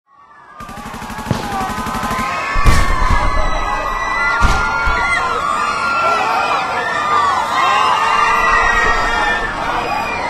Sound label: Screams; 30 Days to Survive